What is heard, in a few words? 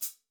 Sparkle Foot A, Hats; Airwindows